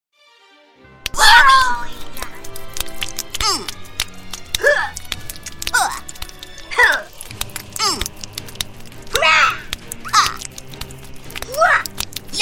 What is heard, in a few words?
hehehe